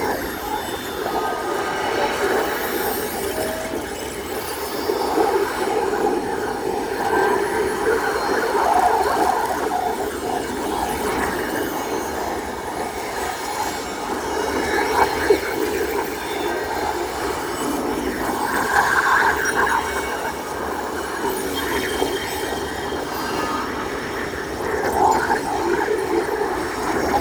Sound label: Tailor Shop Whisper Loop; Interior; Warframe; Nineteen Ninety Nine